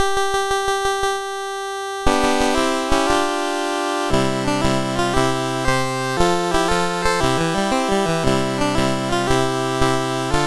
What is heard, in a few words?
Goober Peas, MOS6581R3